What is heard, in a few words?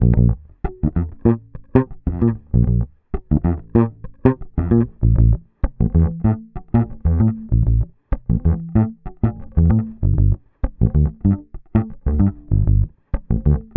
Bass loop 96 F, Electric Bass Loops Direct; Slate Digital BASSHOLE Sample Pack